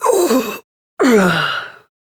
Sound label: Death; Warframe; Corpus Lich; Persona C